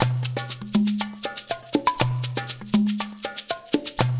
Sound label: LOOP, Percussion; InVision Interactive